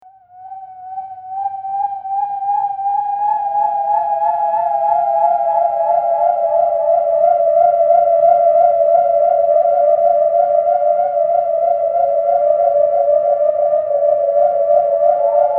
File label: Poiawhiowhio